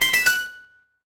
ui alert

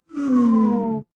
feedback fail

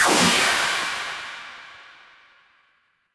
magic scifi laser

